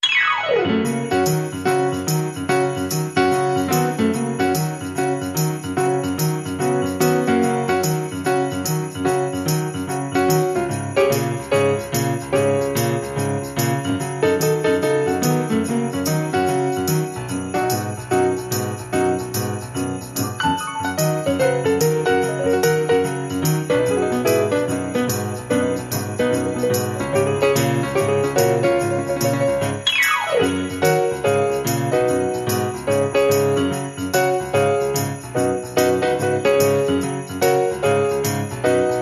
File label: Piano and Tambourine